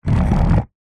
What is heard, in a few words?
household chair sofa drag concrete wooden legs scrape